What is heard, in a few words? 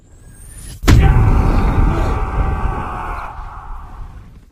rage; minecraft; Votive Content